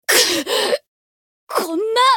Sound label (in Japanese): 死亡; 迅雷のアドリアナ